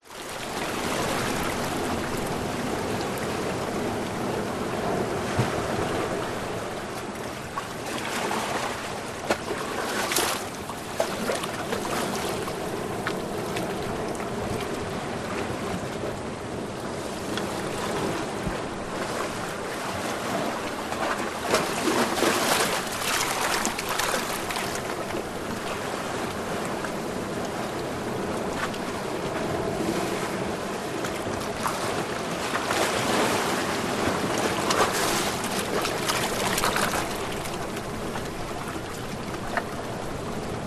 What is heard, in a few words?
nature ocean waves lap under and around rocks shallow distant surf